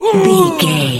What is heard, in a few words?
voice male battle shout short